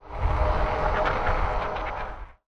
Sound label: idle, weapons